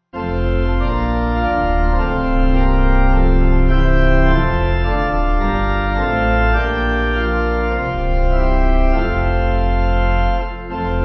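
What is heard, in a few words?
Pipe, hymn backing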